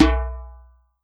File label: WORLD DRUMS MIXED DRUMS; World Instruments 2; PS MIXED DRUMS